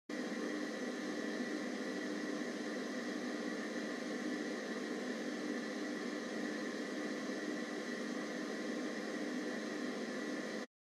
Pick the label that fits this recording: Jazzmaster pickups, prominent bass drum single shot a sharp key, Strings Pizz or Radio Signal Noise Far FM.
Radio Signal Noise Far FM